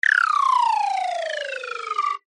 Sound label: cartoon slide whistle descend fall stutter fast